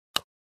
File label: Bone Break; punches kicks hits bones